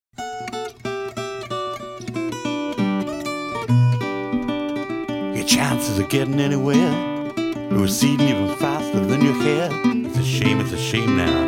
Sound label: Blues